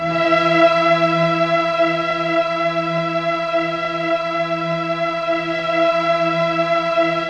ORCHESTR, STRINGS; Rubber Chicken Software Sample Bank; REAL INSTRMT

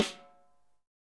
snare dry; Real Mega Drums

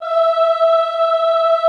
OOH E4, Classical Choir; Best Service; Extended Classical Choir; OOH MIX

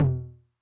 HI TOM, Med Tune Long Decay, 909; classic machines; HT - HI TOM

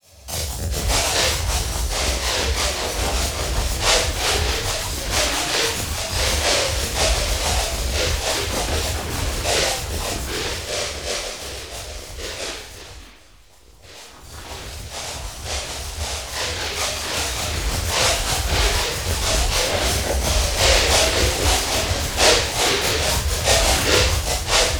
WORMS IN BRAIN; Zero G; Malice In Wonderland

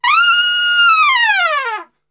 F 4, Brass; Best Service; Brass Super Section; TRP LNGFLL

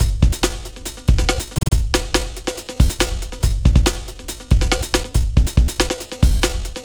Live Break, Breakbeats; KJ Sawka; Drum n Bass & Beyond

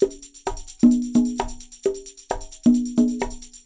Percussion, 132; Sampleheads; NYC Percussionworks; 90 s Sample CDs

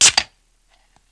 OPEN BOX, BAR AND PUB; East West; Global Sounds

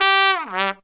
TRUMPET FX, Phantom Horns; Zero-G

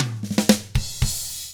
ROCK, Drums; ILIO; Double Platinum Drums 1; 116 ROCK

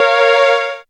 synth a#, Dance Synths, Stabs & Hooks; Best Service ProSamples vol.37

